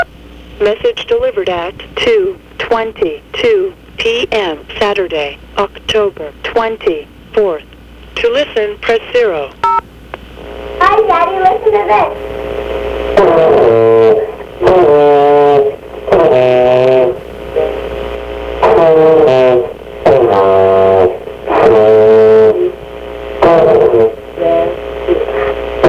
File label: baritone